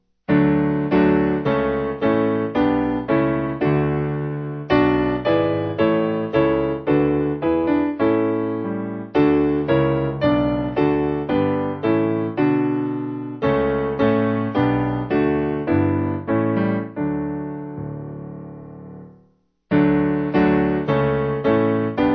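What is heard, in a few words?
Piano, church music backing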